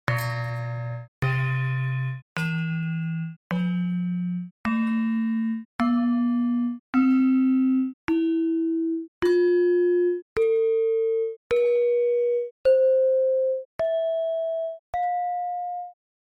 gender barung pelog barang

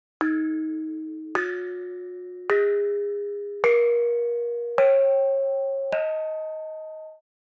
kenong slendro

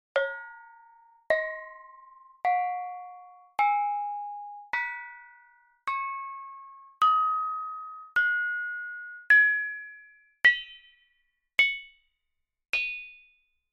bonang panerus slendro

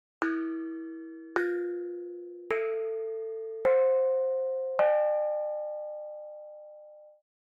kenong pelog

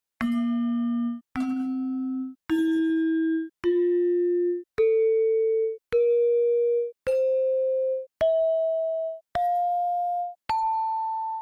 gender panerus pelog barang

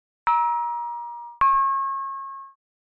kempyang

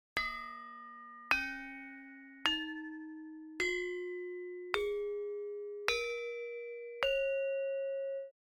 saron demung slendro

